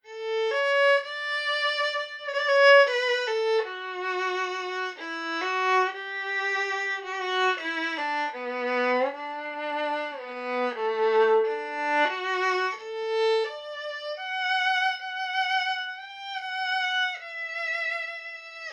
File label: Violinist, solo